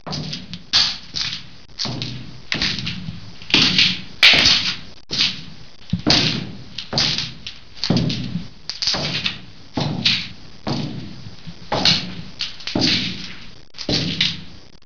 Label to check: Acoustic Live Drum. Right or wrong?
Wrong